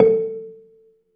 BELA A3, BELAFON, Asian Traditions; USB Soundscan vol.60; AKAI

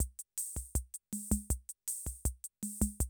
CR78, DrumLoops

